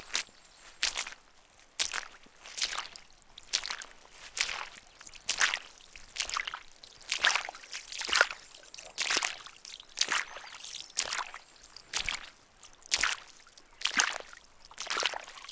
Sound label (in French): pas dans eau